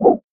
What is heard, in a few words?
whoosh